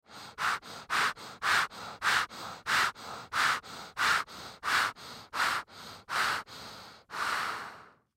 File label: Human Breathe Fast Rubber Mask; Human Breathe Fast To Slow Rubber Mask; Scary Breathing; Horror; Blastwave